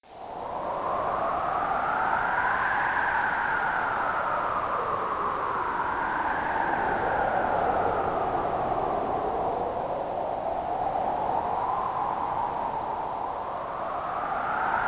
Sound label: song; medievalfantasy